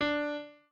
piano

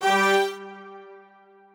strings

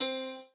piano

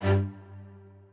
strings

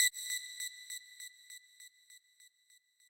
lead

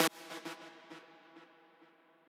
lead

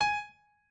piano

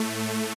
lead o4a

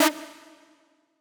synth

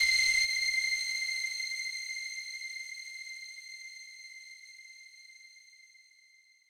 lead

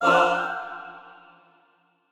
chor

